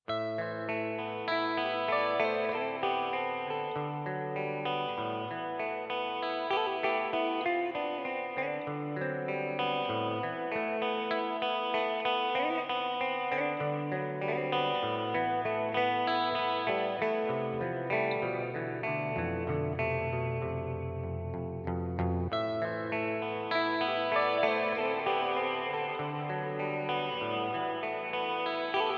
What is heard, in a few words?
Room Reverb Neunaber Immerse Reverberator MK II